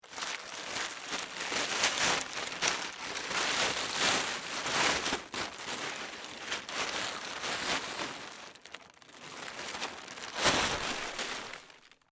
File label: Plastic Tarp Unfold, Foley; Materials; BlastWave